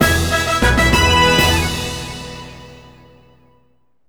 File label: Sub Boss Defeated; Digimon World 4